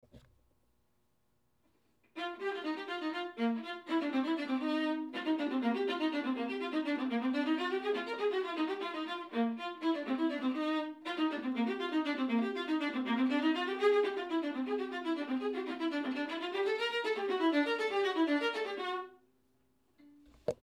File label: Viola; Mendelssohn; Year 2 Kansas All-State Orchestra Excerpts